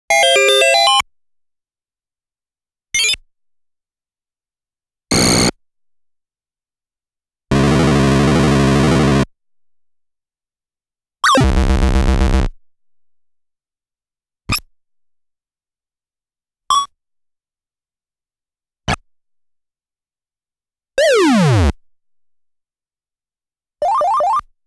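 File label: sound effects collection; Namco Graffiti 1; XEVIOUS S.E. COLLECTION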